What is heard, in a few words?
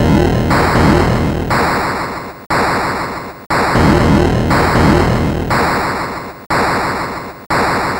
Atari 2600 Noise Drums; 8-Bit; Chiptune